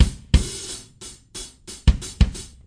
090 funki bd and hat, drum loops

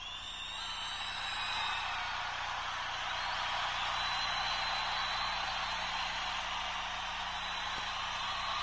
screaming crowd; Sound FX Real Life

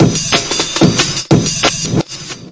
183 bpm, DRUM LOOPS and BREAKS; 181 bpm plus

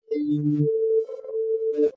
boku soul, Synth

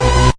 scarynote, Organs

